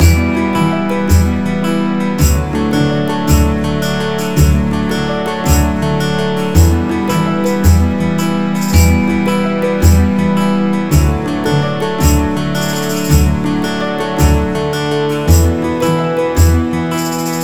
Medieval Thoughts - Short Loop, soundtrack; atmasphere windows gamerip 2018

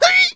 weird effort; Scrat

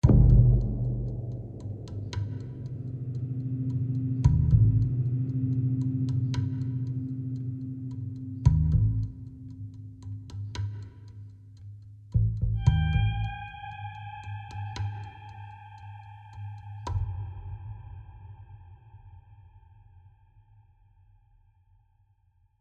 Tension

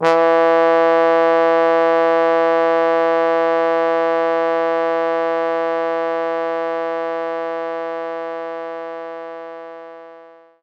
Trombone E2; knights try

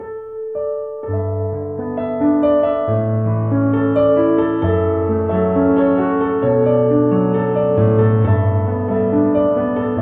Piano Segment; Finding Paradise; Kan (Reives) Gao

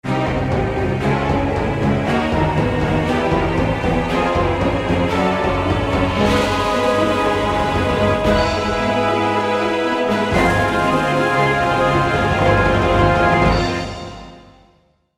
Ultimate Lose; heroes of might magic iii hd 2015 ios

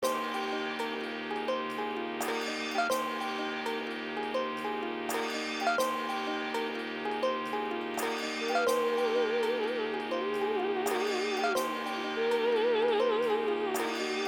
Skeleton Loop; Chuchel

